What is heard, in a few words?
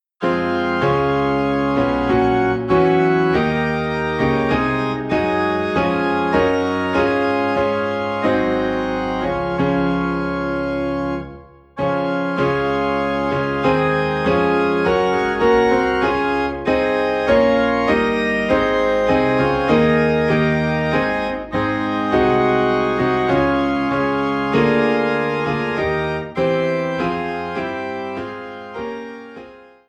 Piano and Organ in D Flat; Almighty Father Strong to Save